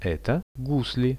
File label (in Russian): eto gusli; Это гусли; Russian; music; RUSSIAN VISUAL DICTIONARY